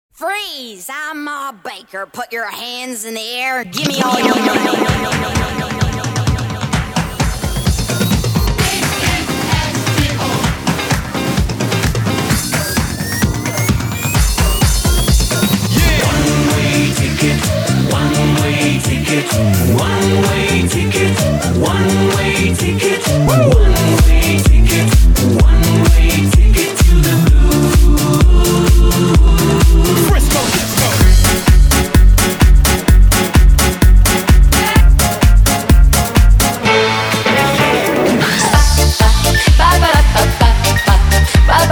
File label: Disco DJ